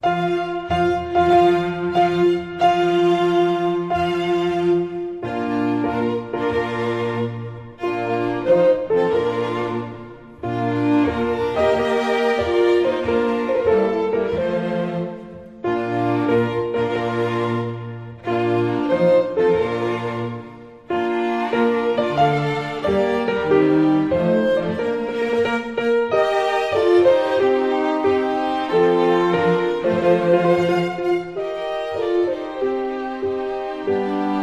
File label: Bridal Chorus (piano and string quartet faster version)